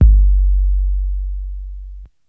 long room reverb steel kick drum g key, Royality free bass drum sample tuned to the